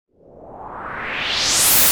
HOUSE FX 125

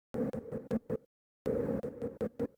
Industrial 160

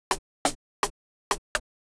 HOUSE PERCUSSION 125; Beats

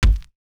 Kicks; One Shots; Touch Loops; CosmicFunk

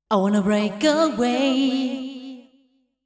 deep house female, 125, A, vocals

